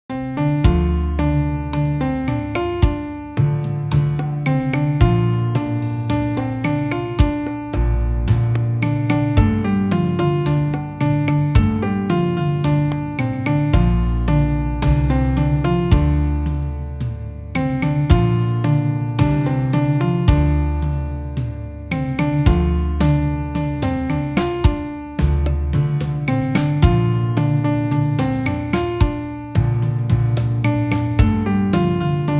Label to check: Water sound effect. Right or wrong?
Wrong